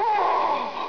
"OOH"

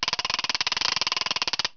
CLICKING; toon

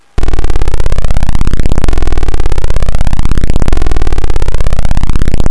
CHOPPER

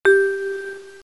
HOORAY

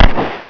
SHOT

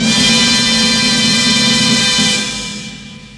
FANFARE

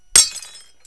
GLASS

